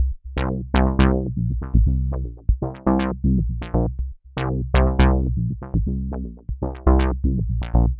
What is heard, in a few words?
Bassline, Prototechno